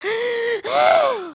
panic, emotes

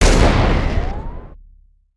charge

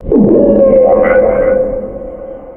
Klaxxon

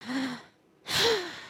sigh

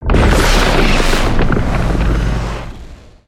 Spinjitsu Earth Tornado Start

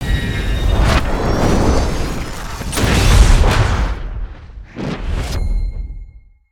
Rocket Landing; foley

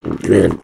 Attack; Skullkin; creatures organic

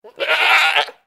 Pets Goat Angry; creatures; Lego

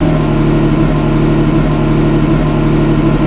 idle loop, vehicles; aronwk collection; ndaudio

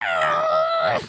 disappointed; emotes

